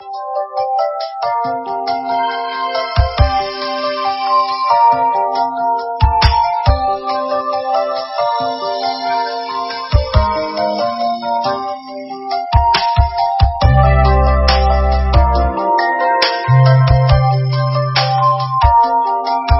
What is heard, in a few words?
fish bg; monkeyfish